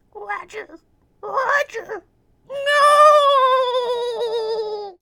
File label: Male Reaction; a dump in the dark